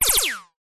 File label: multimedia game sound laser gun fire high pitched